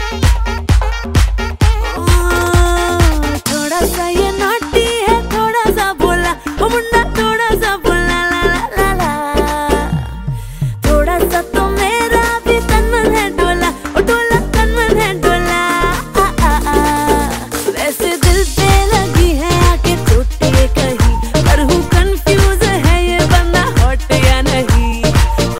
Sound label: hindi ringtone